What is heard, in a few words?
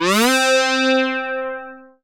syn c, Dance Synths, Juno; Juno Jam